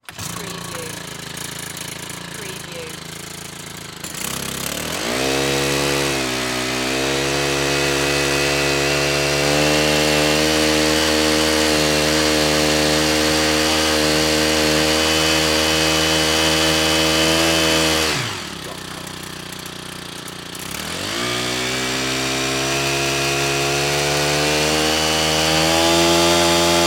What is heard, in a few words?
Hedge Trimmer Operate; sound-effects-bible